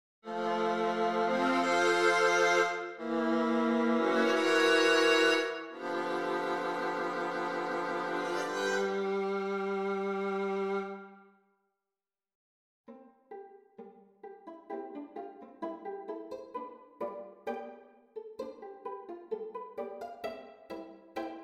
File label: Pizzicati for viola quartet; Delibes